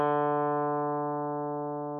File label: D3 146.83Hz 8 string; tuner